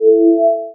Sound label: helpful event